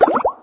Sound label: science empty recycle bin; windows 9x; sound schemes